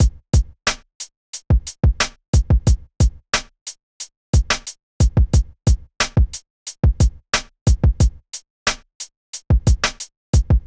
Beat 10 Band Para; Conquering EQ

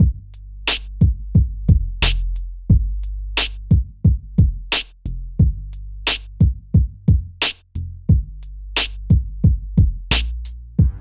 Mix with kick and snare; Conquering EQ

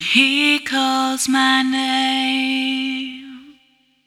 Nasal effect; Conquering EQ

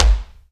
Paragrahic mid to top; Conquering EQ

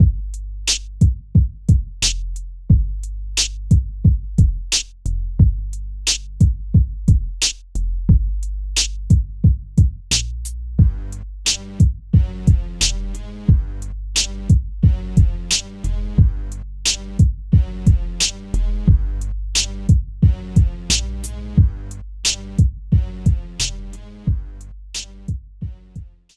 Mix EQ; Conquering EQ

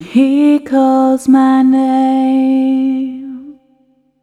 Warm Total Frequency Range; Conquering EQ

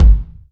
Parametric high shelf; Conquering EQ

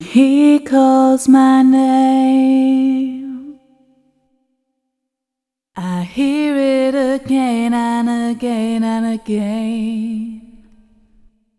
Vocal clean; Conquering EQ

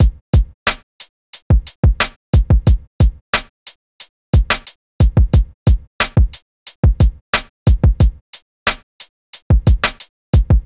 Beat 90 bpm; Conquering EQ

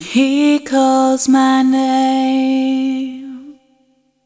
Very Soft Top; Conquering EQ

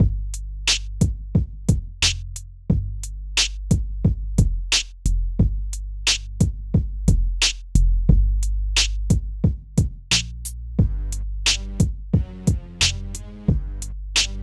Mix with bass EQ; Conquering EQ

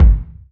Parametric boxy; Conquering EQ

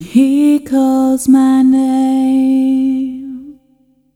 Soft mid roll-off; Conquering EQ

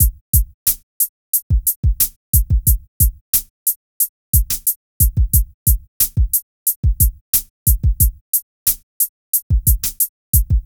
Beat shelf bass tilt; Conquering EQ

